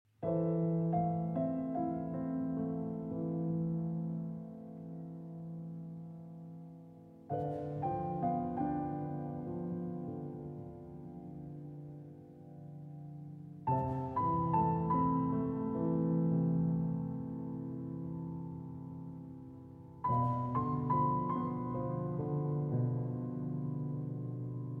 Piano Duet; VI. Terrible Interlude

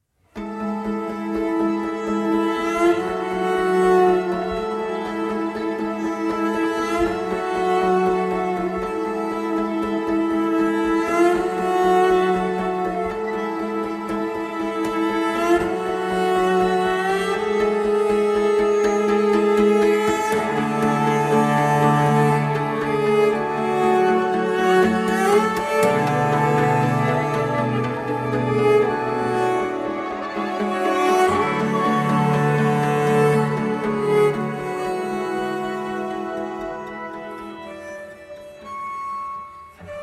String Quartet Version

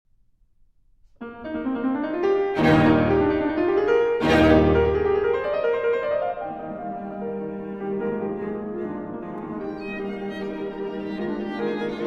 Piano Trio No. 6 in E-Flat Major, Finale. Allegro

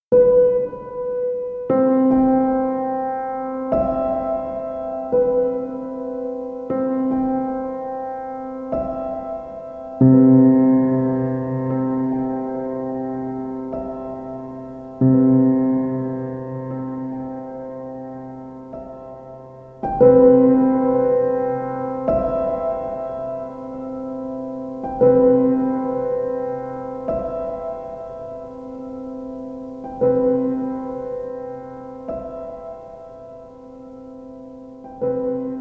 Piano Sounds

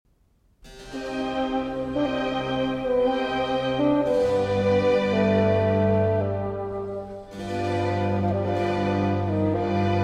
Bassoon Concerto in C Minor

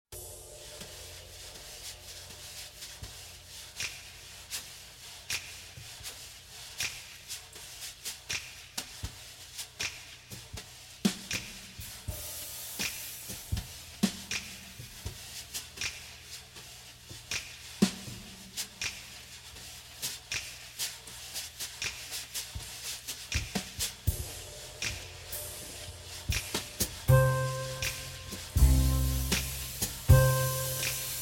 Instrumental

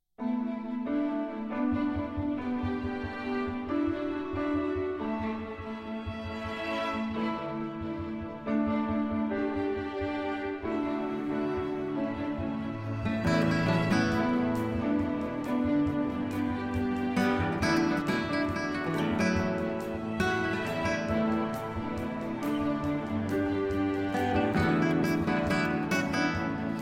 Concerto For Guitar and Orchestra

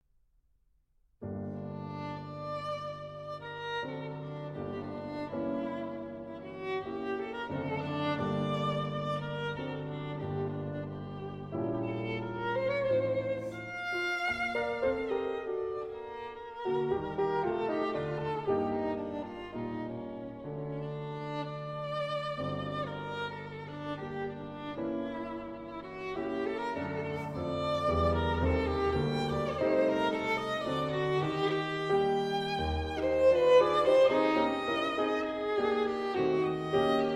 Violin and Piano; C. Schumann; Op. 22

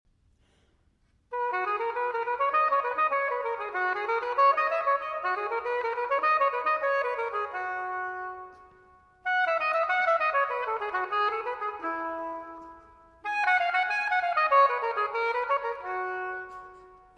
Sonata in C Major II. Allegro

